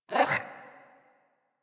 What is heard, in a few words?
Puppy Interact Bark Speak; Enemies; Kubrow; Warframe